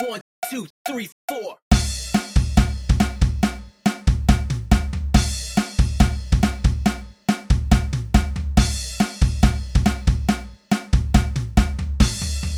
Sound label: curry beat drumline cadence